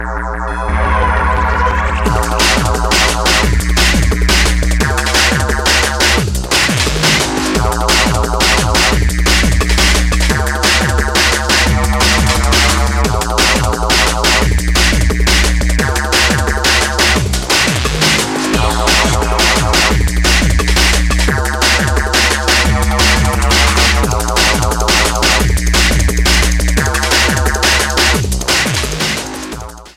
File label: reversed